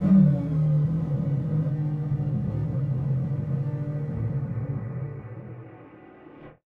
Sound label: Malfunction Synth, malfunctioning synth samples